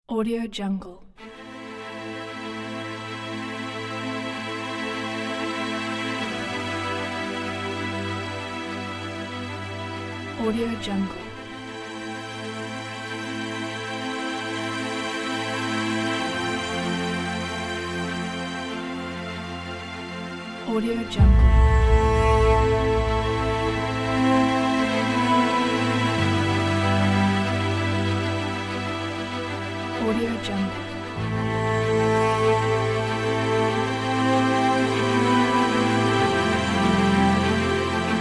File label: intense cinematic trailer